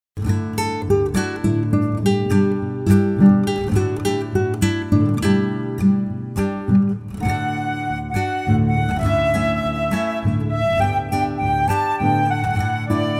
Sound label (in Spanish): Pista con melodia instrumental